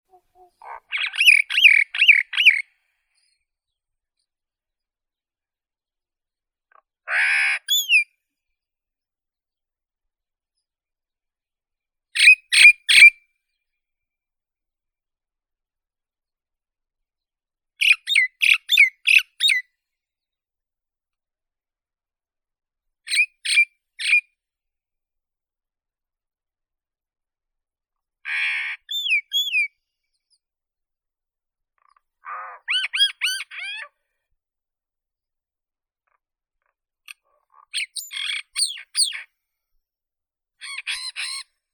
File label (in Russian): maina obiknovennaya acridotheres tristis